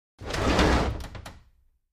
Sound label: Large Chest Slide